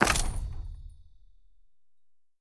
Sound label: quick Stop Bounce; netent